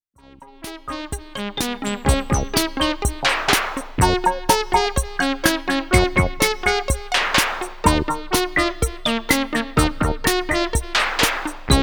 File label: SH-1 Electro